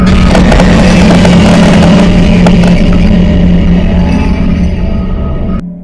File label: scare